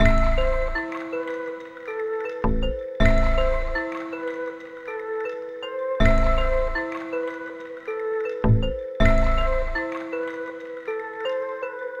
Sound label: Melody Loop 160 BPM A Min, Hip Hop; Melodics; Melody Loops; Cymatics; Cobra Hip Hop Sample Pack